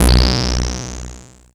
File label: Filtered B, Basses; Zero-G; Total Drum Bass